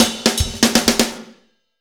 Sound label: Fast Shuff, Drumscapes Roland; Northstar